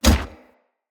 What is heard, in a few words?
Heavy Grunt Boot Walk Gravel, Footsteps; Enemy; Sinecure